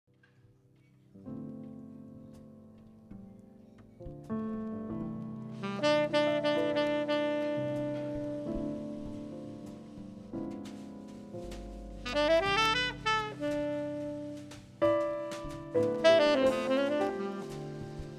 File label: sax sample